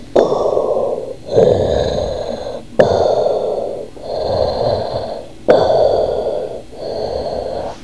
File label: SCUBA